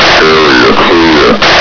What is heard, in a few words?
radio clear